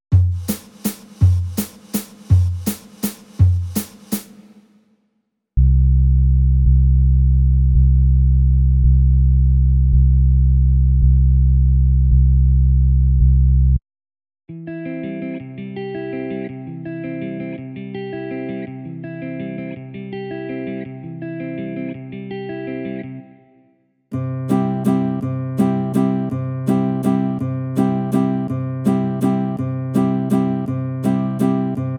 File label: Slow Waltz parts